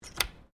Door Sliding Exterior Aluminium Flyscreen Latch Unlock; Various doors